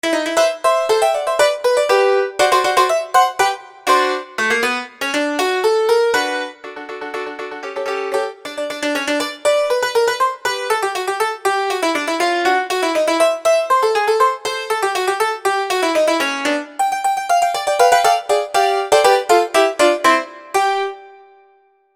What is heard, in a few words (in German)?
Alpine Volksmusik Hackbrett